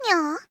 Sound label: boop